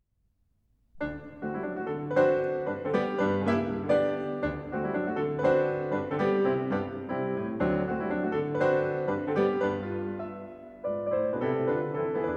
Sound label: Nocturnes in B flat major, Piano; Chopin